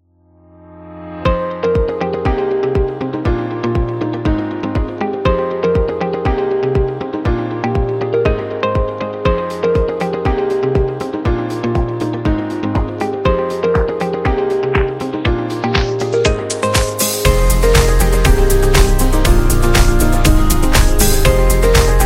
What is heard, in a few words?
Waiting Music